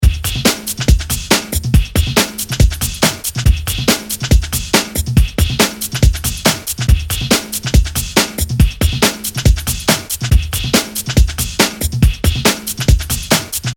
loop comp; compression

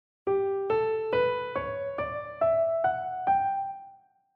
G Lydian mode; key signature

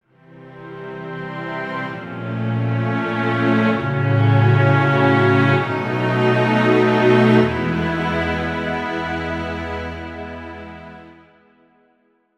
Using 7, altered chords; altered vii

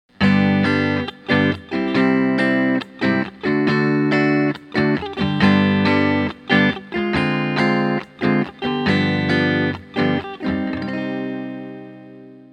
Vm7b5 as II; altered chords

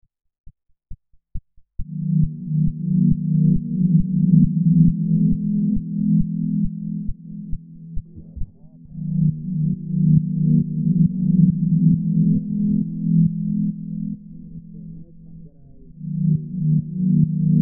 removed noise, electronic productions; reducing mud

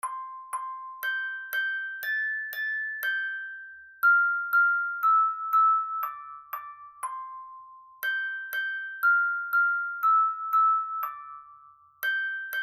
twinkle; songwriting

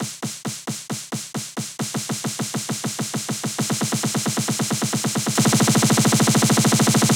Snare roll; snare roll generator

